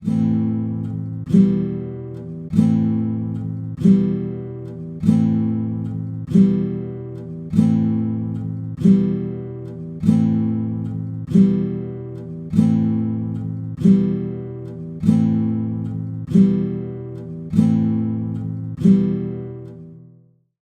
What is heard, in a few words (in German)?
Gitarrenbegleitung; Heho spann den Wagen an